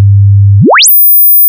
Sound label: signal